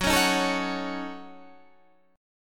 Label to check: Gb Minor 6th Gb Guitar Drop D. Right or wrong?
Right